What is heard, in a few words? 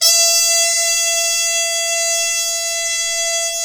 BRS, Harmon Tps; Roland L-CD702; Harmon Sect